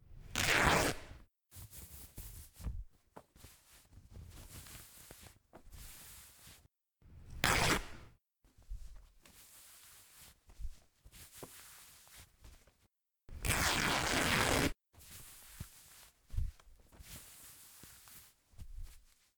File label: bandage Self; Apotheorasis